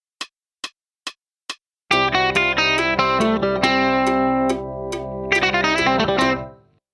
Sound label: lick